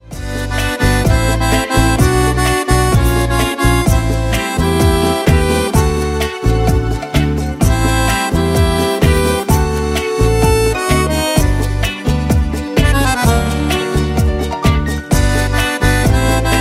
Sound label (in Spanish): balada